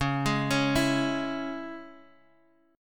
C sharp Minor C sharp Guitar Half Step Down, chord